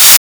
Star Instruments Synare PS-1

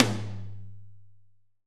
AMBTOM, ROOM AMB TOM; R.AMBTOMM2

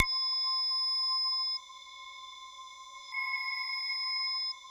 AQUAMARIMBA C5, Yamaha VP-1; Music Store; 90's Sample CDs

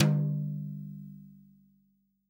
TOM, REAL DRUMS, SWING KIT

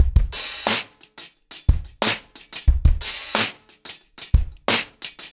90BPM, ACOUSTIC DRUMS

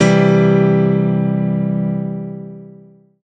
M1 Piano Chord, Old Skool House; Big Fish Audio; OSH Chords

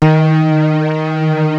DEEP

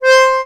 ACCORD C4, Accordions; USB Soundscan vol.40 - Complete Accordions; AKAI

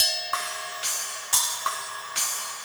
Cymbol Particle; Particles

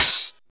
Open HH, Cymbals

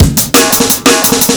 dub, Loops, breaks; m8 breaks

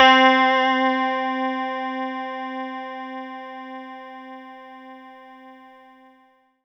C5, Synth Line

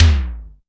SIMMONS SD-5 drums

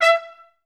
TR STAC E4; Best Service; Peter Siedlaczek's Advanced Orchestra

